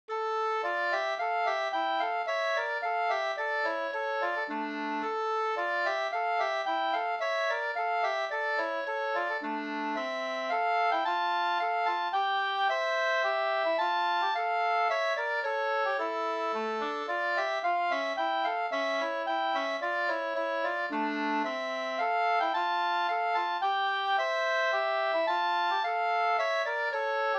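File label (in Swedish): polska, tvåstämmig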